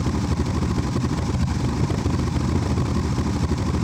Aircrafts Engines Packard Merlin Prop Cockpit Exhaust Start In; DCS